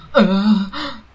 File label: pain; cstrike; radio